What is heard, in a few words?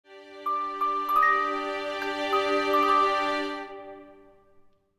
Game over you lose goodnight mystery